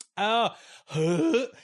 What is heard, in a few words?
argh